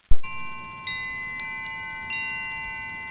ringing

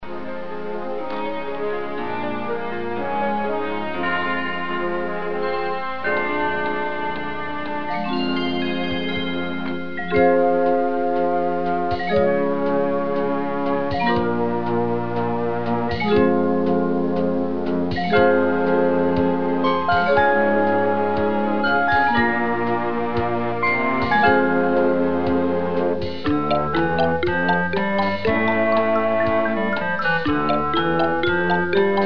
closing music; clue

